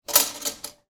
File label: medical dentist metal tool movement in tray